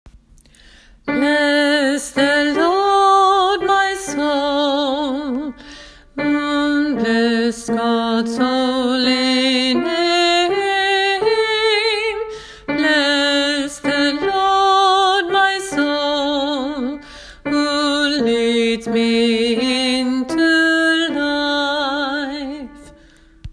bless the lord bass; åfjord

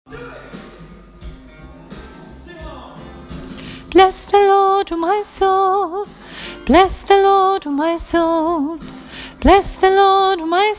sopran bass; bless the lord ogc del 1; åfjord

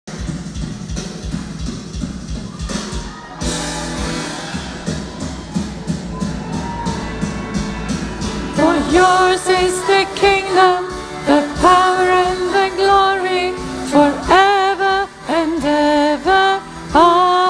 bless the lord siste del bass tenor; åfjord

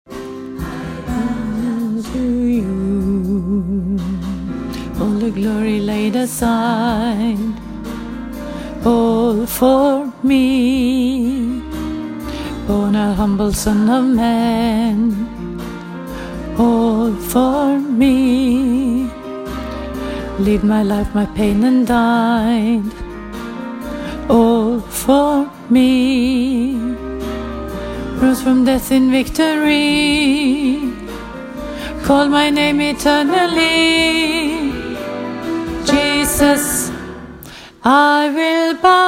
bow down del 2 alt; åfjord

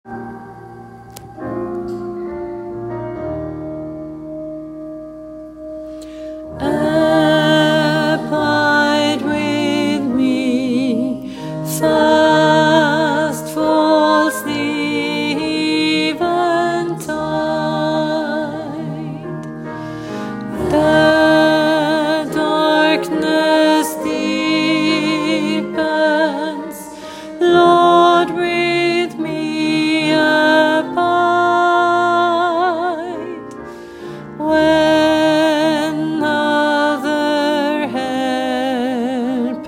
abide with me alt; åfjord

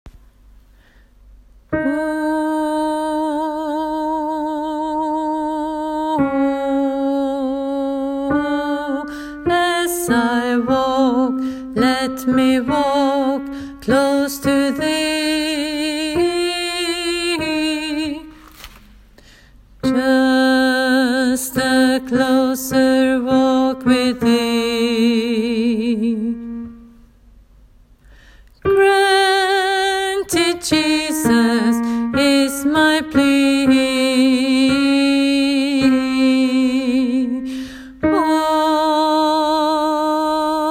just a closer walk alt; åfjord

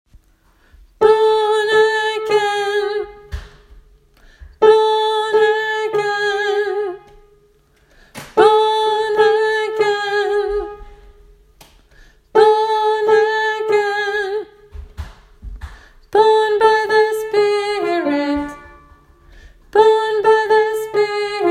born again avslutning alt; åfjord

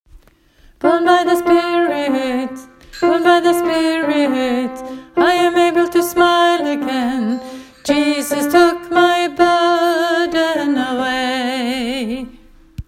born again vers alt; åfjord

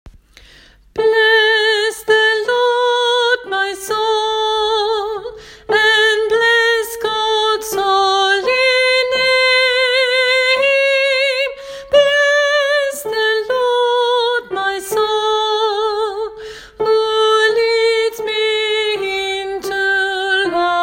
bless the lord tenor; åfjord

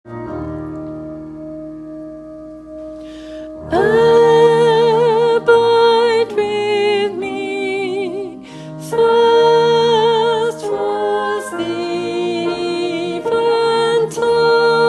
abide with me tenor; åfjord

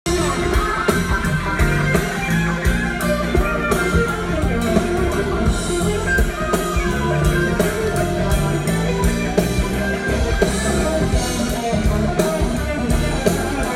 tenor bass; born again del 2; åfjord

